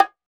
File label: Bongo High, Roland BK-5; Percussion